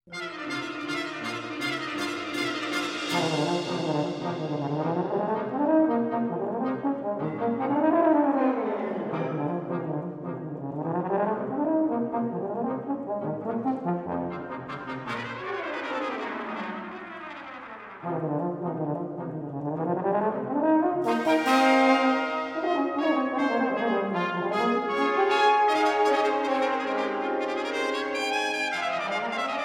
Prism, Shards Of Color for Brass and Percussion

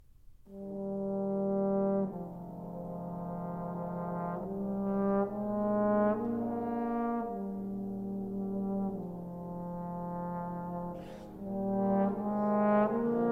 Trombone Concerto, Adagio

